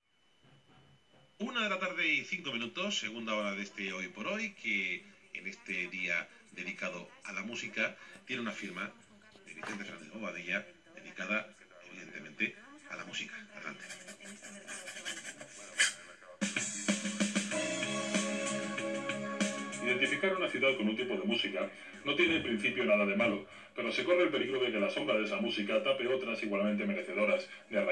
musica

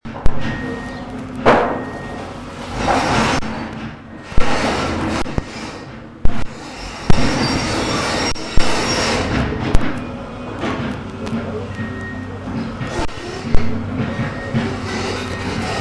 despertador extremo todos los dias igual

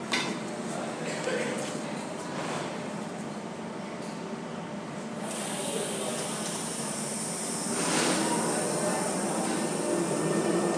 sonidos de paris la gare

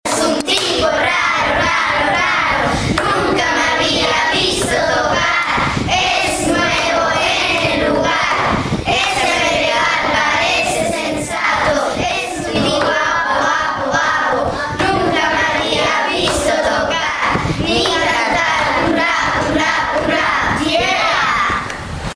rap; CEIP Alcaravaneras

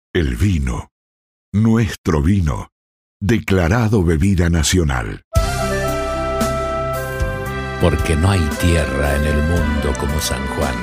jingle; vino bebida nacional

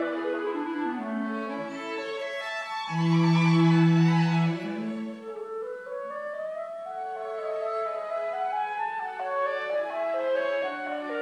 quinteto para cuerda y clarinete

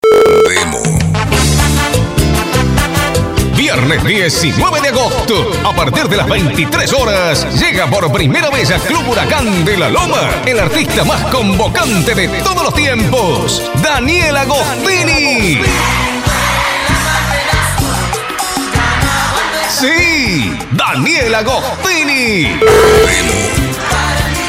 audio estilo tropical

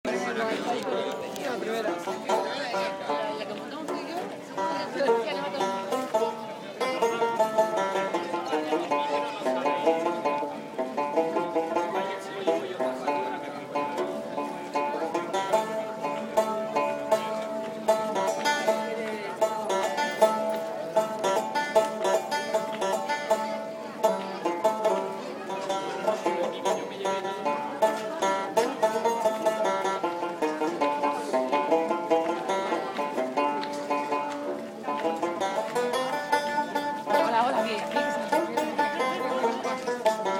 banjo en el campo

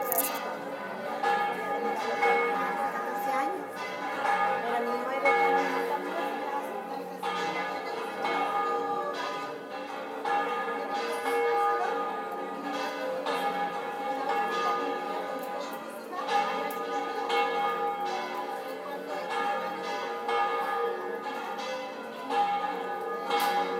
campanas mezquita

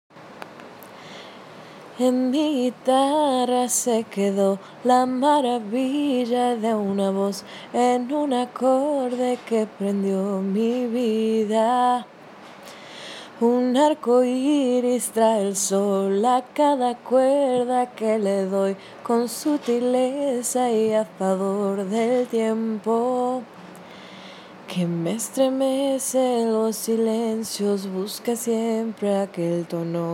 en mi guitarra

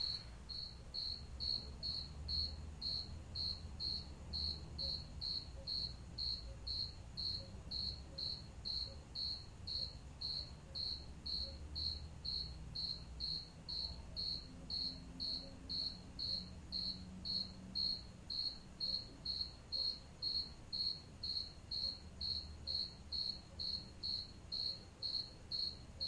grillo

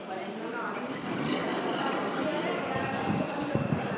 puerta automatica abriendose

